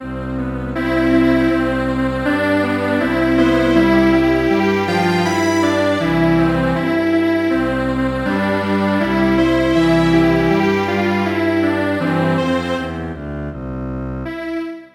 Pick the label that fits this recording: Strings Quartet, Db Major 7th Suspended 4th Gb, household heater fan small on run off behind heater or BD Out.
Strings Quartet